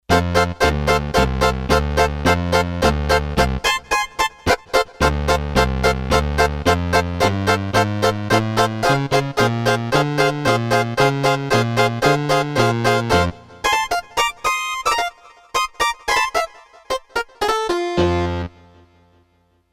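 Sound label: Rock'n Roll electric guitar, Roland D-10; Factory Patches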